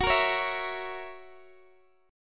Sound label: Gb 7th Gb down Guitar Open D, chord